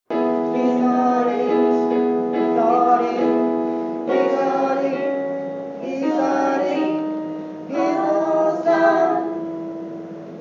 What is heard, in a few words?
Soprano